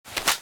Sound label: oicw move carbine